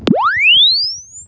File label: Press Two Sides Chirp; Samsung 4 Recordings